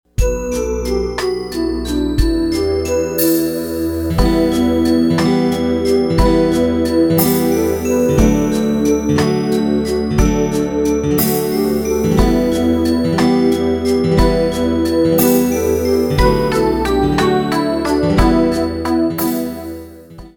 Swallowtail Jig

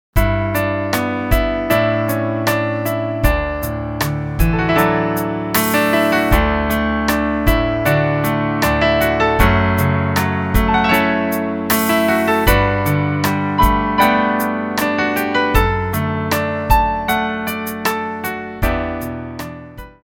Twinkle Variations

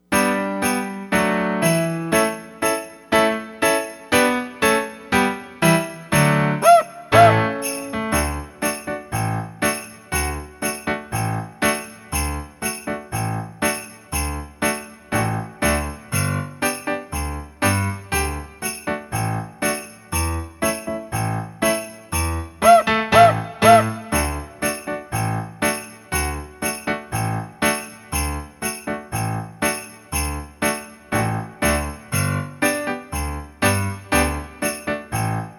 Jingle Bells

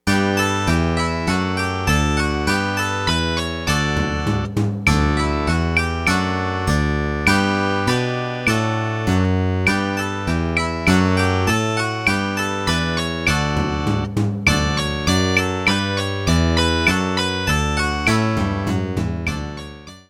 Twinkle Twinkle Harmony

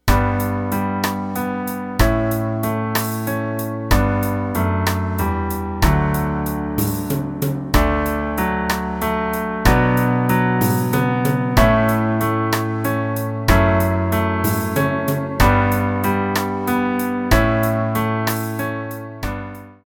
Dotted Half Waltz Melody

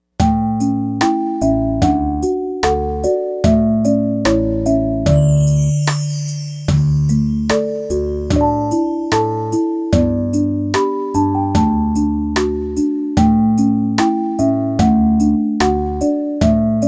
Twinkle Twinkle Melody

